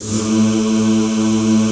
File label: ZZZ A1, Classical Choir; Best Service; Extended Classical Choir